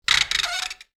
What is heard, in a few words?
Rubber Squeak